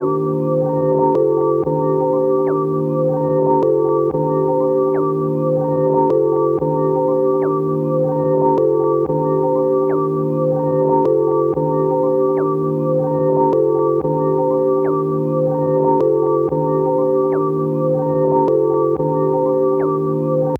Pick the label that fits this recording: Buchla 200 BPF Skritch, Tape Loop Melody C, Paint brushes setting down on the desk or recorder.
Tape Loop Melody C